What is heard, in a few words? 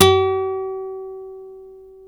NYL NV G#, nylon no vib guitars; Spectrasonics; Hans Zimmer Guitars VOL-1